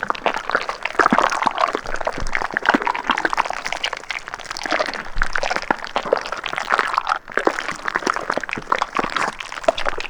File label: Spider Trap Grab Loop; Lurker; Evolve; Gorgon